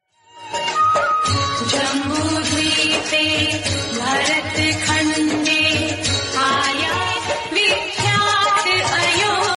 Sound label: Ramayan devotional ringtone